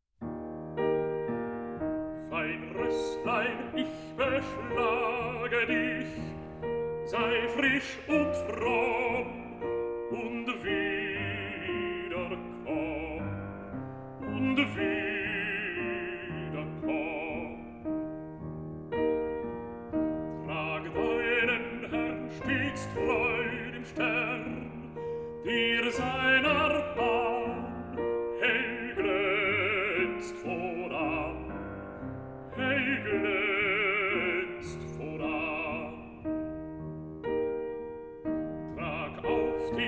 Songs for voice & piano; Lenau; No. 1. Lied eines Schmieds; Schumann

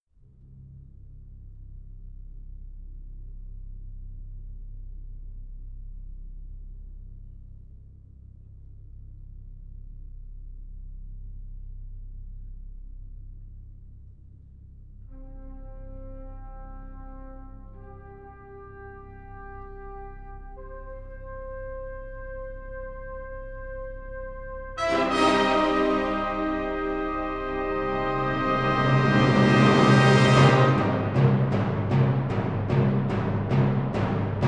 tone poem for orchestra; Telarc Classical; Also sprach Zarathustra (Thus Spoke Zoroaster)

